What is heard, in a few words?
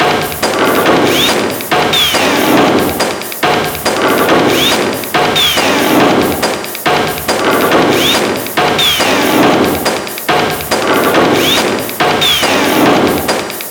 reveal music, construction